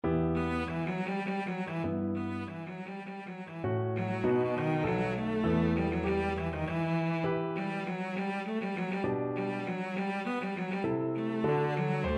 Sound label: cello